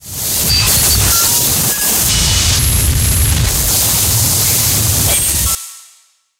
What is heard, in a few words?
sonar Distortion; Barotrauma; Real Sonar